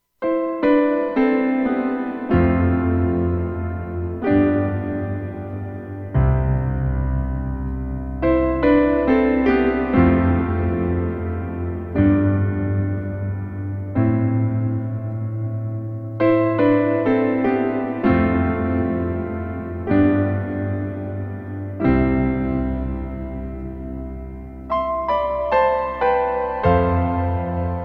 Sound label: A Sad Goodbye; Remixed